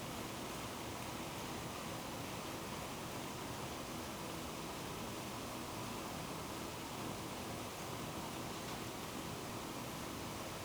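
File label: hydrophone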